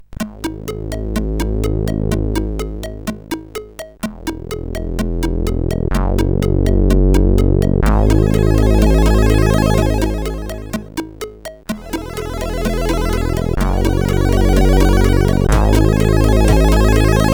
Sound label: Soft Voice, sid, CSG8580R5